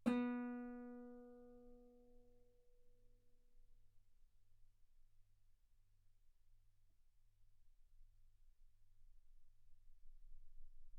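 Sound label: Guitar ff B string B3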